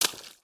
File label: Foot Swamp Water Run; footsteps